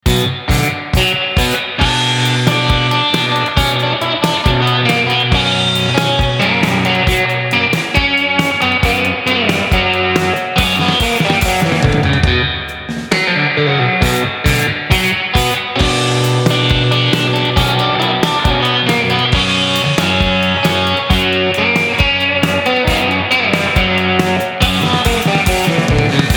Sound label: Tango (Frailach)